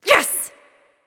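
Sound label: yay, female; smite; voicepack; hera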